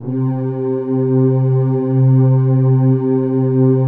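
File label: PAD, atmospheric pads; Best Service; XX Large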